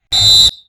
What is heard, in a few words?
Whistle